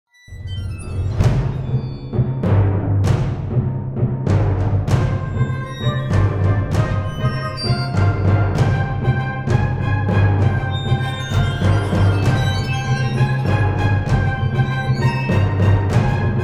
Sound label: Tension Layer